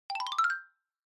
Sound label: Xylophone Slow Short Up